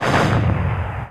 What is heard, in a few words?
raid shoot